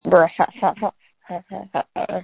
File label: screams